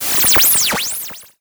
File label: Transition Digital Scanner; Adobe; Production Element